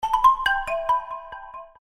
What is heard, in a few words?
kiss sms, notifications; Meitu; Kiss C520